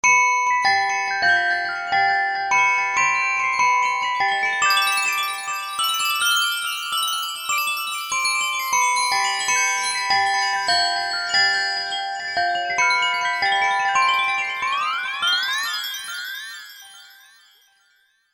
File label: Reversed Glck; V-Synth